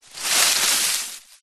foley tree branch small leaves short movement drag ground